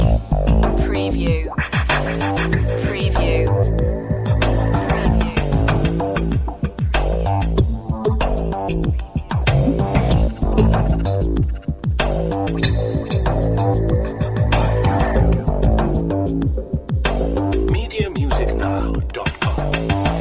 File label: menhir loop